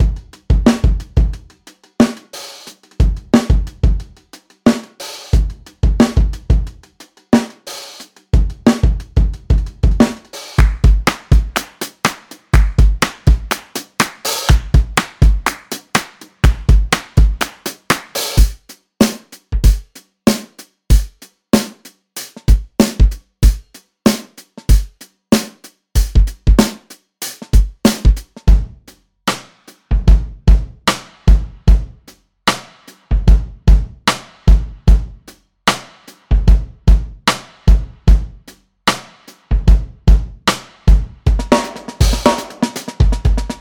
presets kit, addictive drums 2 hip hop and gospel collection